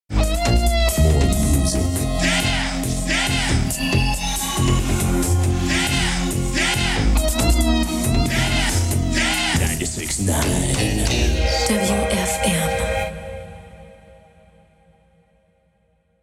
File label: Jingle (Yeah yeah)